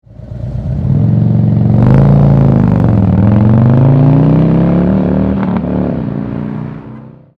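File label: TAKEOFF, motorcycle; Exhaust Sound - Take Off; Vance & Hines Deluxe Slip-On Mufflers For Harley Trike 2009-2025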